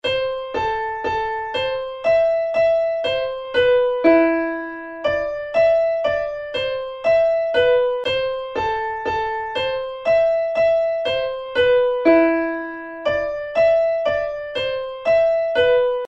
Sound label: sad mobile ringtone